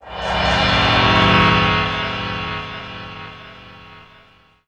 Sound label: CYM VELOPE; Rarefaction; Poke in The Ear With A Sharp Stick